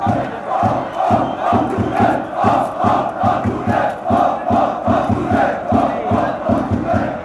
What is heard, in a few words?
oh oh oh, chants; fc thun